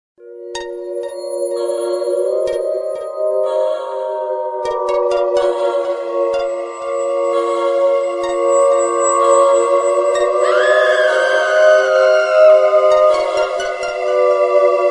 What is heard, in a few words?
suspense